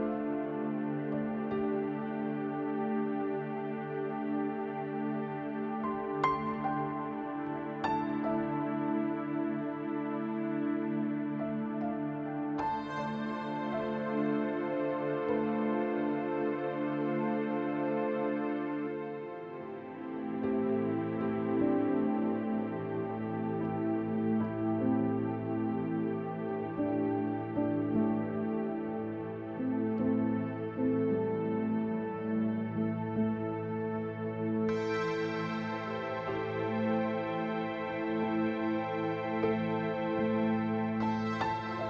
Very Calming Music, Perfect Meditation

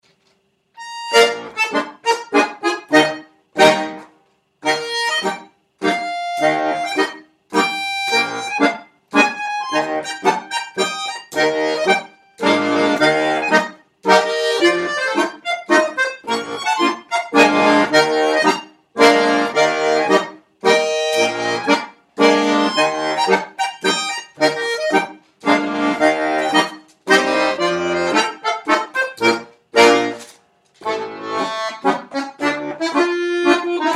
Mazurka

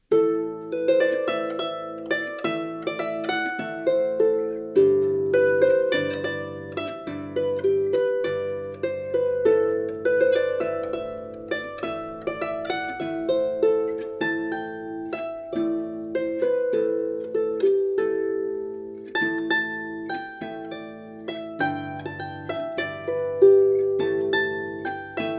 Celtic Music, March

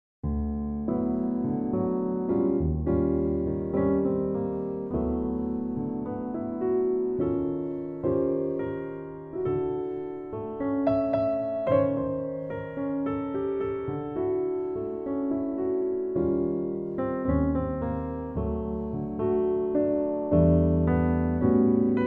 Relaxing Piano

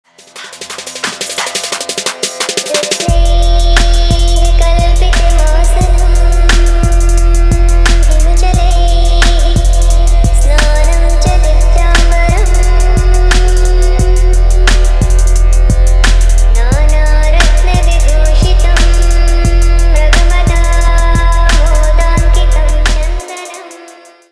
ARABIAN TRAP, RINGTONE

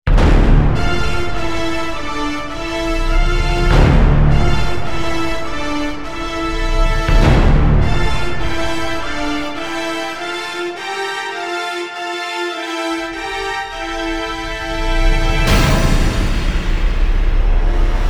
Epic Proportions No Choir

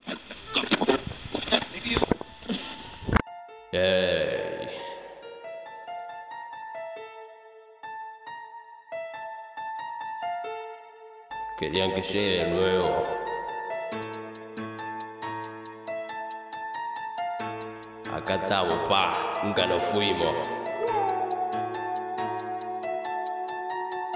Trap

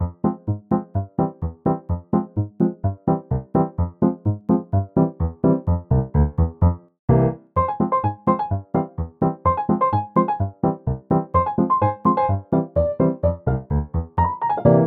Orquesta

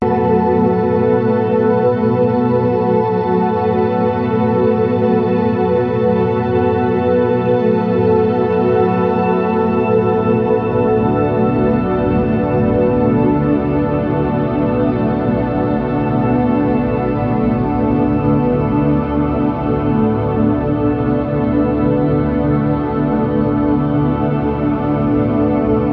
Chill Out Music

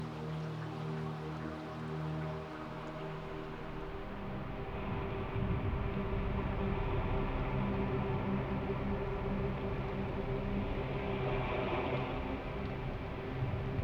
White Noise Nature Sounds Rain Sounds

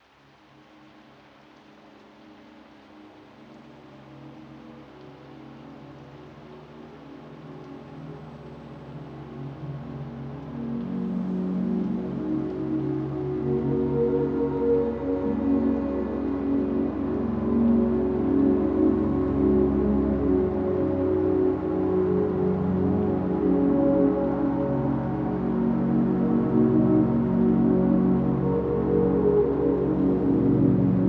Binaural Beats

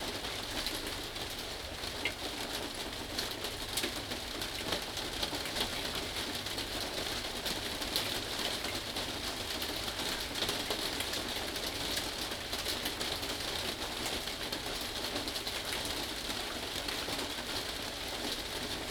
Inside the Greenhouse, Rain Sounds; Regen zum Schlafen

